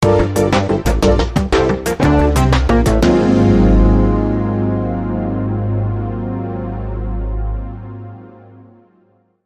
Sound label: Funky Machines Shuffle